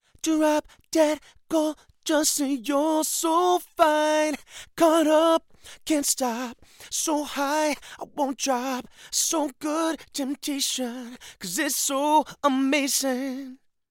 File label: male vocals; lewitt